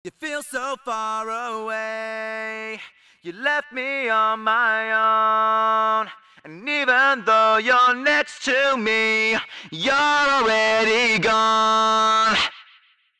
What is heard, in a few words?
waves